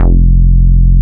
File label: JUNO E-BS F2; Drums In Your FACE!